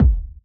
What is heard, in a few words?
electronic kit kick